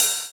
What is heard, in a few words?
Ride; Equipped Music; Loungin House